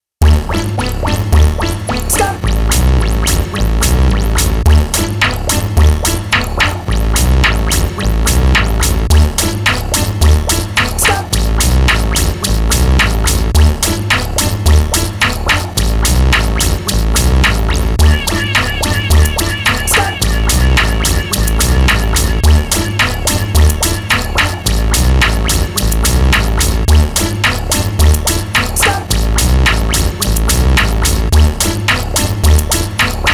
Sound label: Karaoke